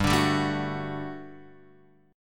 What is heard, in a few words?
G Major 7th Suspended 2nd G down Guitar Open F; GM7sus2 strummed